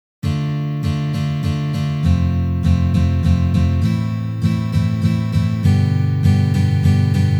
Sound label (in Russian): Valsovyj boj na gitare